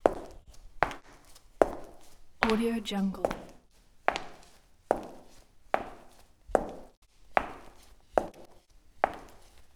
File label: steps